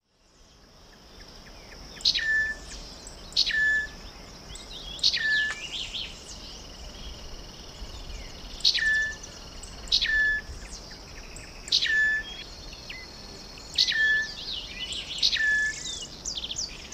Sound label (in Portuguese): canto do tie preto e branco